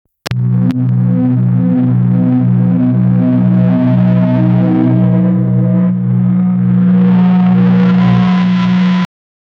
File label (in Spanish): eco profundo campanas